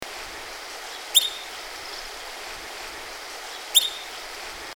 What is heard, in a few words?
Pallas s pika Ochotona pallasi; Yolin Am